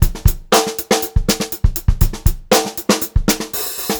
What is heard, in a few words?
FUNKY, Funk Groove Drumloops